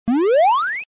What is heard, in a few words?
Arcade 8 bit power up; Level Up